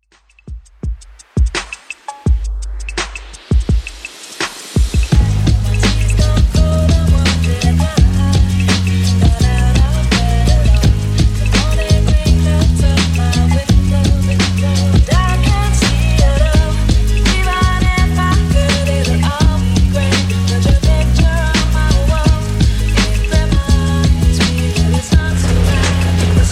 2ks Redrum 84bpm

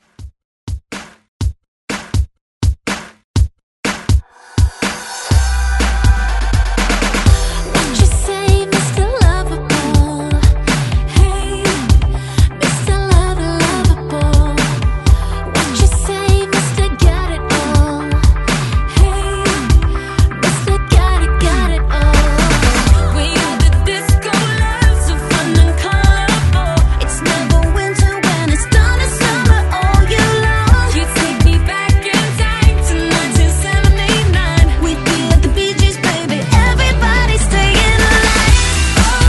123Bpm

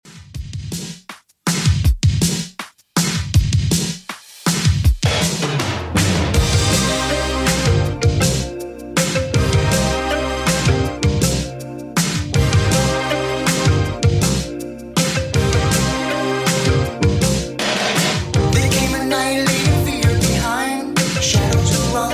80s 80bpm; Redrum

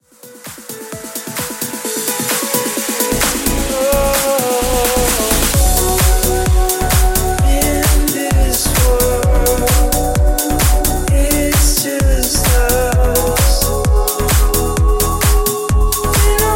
130bpm Bm

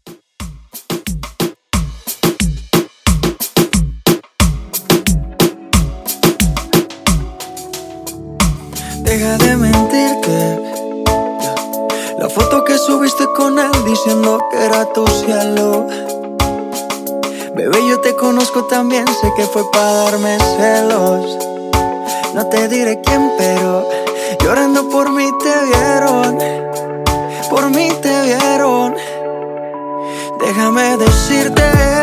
Reggaeton 90 Bpm

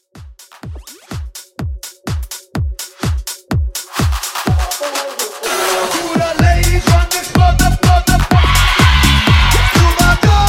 Club Latin Hype 125

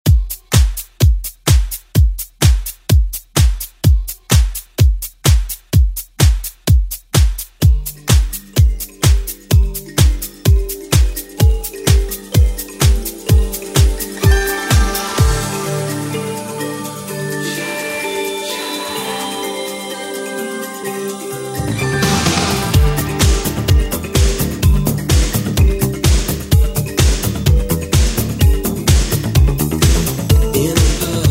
80s Re Drum; DJ Mhark

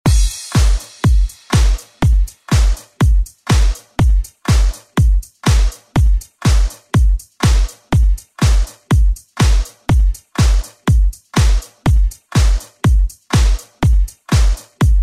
Dance Bootleg; remixplanet

